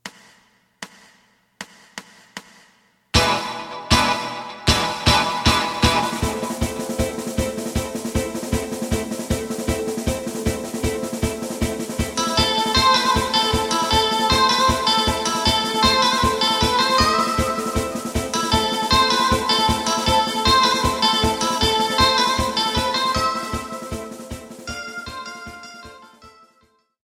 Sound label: bass